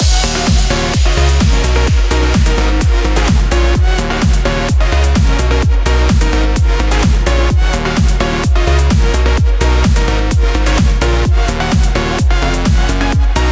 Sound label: EDM loop